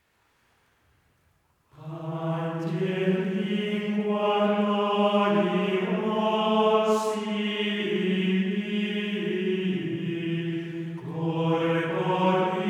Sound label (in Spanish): pange lingua gregoriano